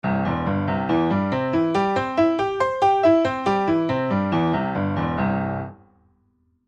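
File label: Long arpeggios 4 octaves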